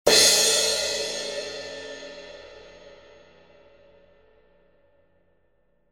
Zildjian

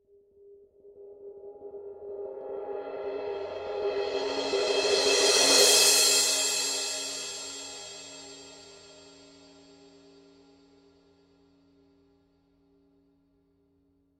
Paiste 2002 18 crash swell

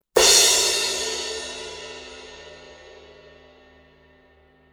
Sabian 16 HH dark crash

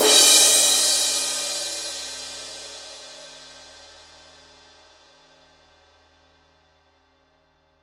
Paiste 2002 20 power crash stroke

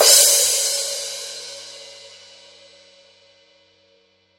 Paiste alpha 16 medium crash edge

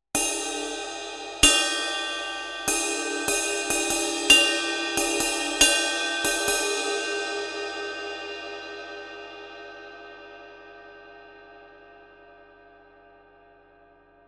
Meinl C21MR

